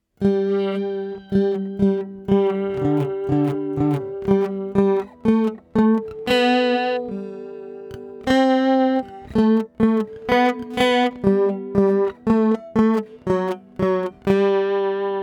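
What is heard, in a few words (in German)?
Melodie Zehn kleine Zappelmänner; kinderliederquiz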